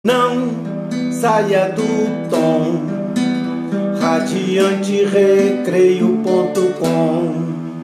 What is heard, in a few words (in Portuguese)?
vinheta; Aníbal Werneck